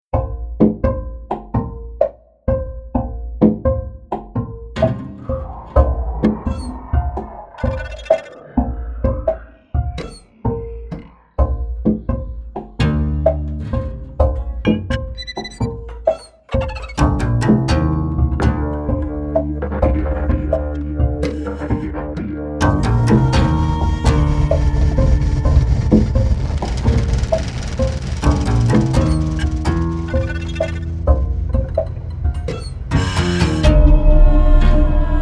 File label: Tortured Strings